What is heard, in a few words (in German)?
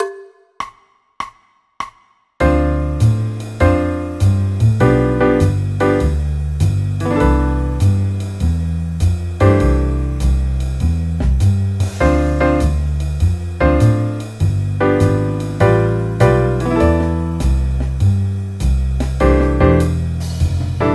c dur akkordverbindungen